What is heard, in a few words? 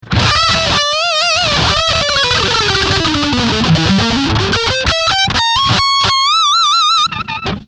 Heavy Lead